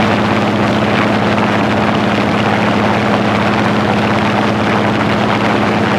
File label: engine ai plane rpm 99 mid; War Thunder; engines